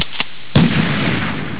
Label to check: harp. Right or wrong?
Wrong